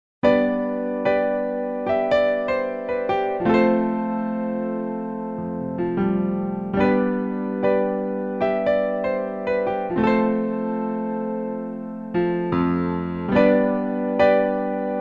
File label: Piano Solo; Soundzabound Volume 1 Disc 3